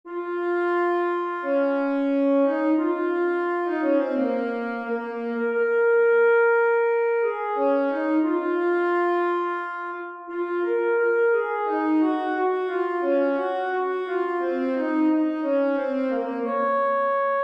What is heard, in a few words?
alto sax